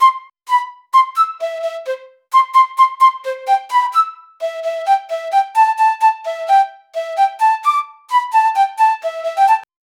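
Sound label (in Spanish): la melodía de la flauta, happy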